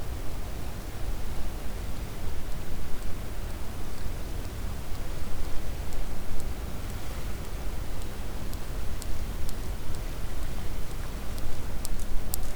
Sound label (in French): cachalot positif, Physeter Macrocephalus